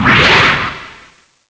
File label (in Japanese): ジャンプ; パワーボムジャンプ; super otousan